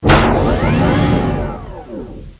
Door; Defiance

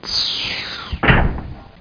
gas end

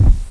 bulf Walk; NETSTORM

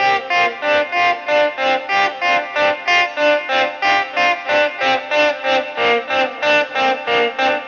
ELEC GUIT ETHNO 125, Guitar; eJay

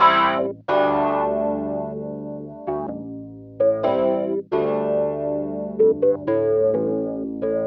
organ house 125 A; eJay; Keys

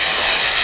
force field hit; MOTS

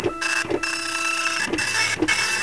Printer; F/A-18 Hornet 3.0

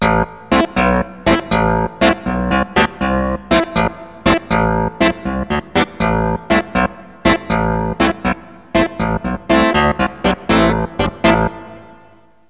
haunted; Wired for Sound Pro CD; Aristosoft, Inc.

